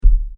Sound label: Bang On The Door, Door Knocking; Doors; Crafted Signals